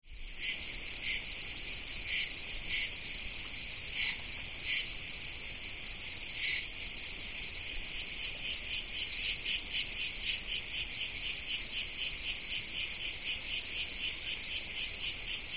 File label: Tettigia Cicada morning; Peloponnes